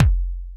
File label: Nu Kick, Yamaha FS1R